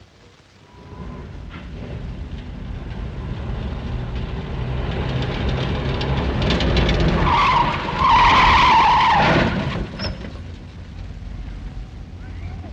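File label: car on sled crashes into tree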